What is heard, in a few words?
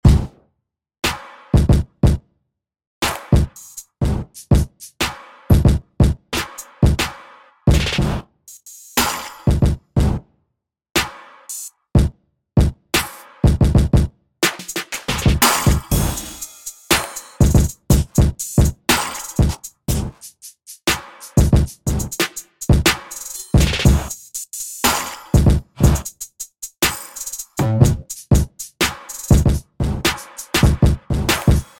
Beat; eerste demo